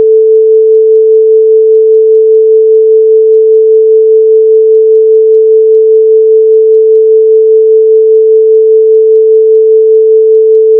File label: tremolo 5hz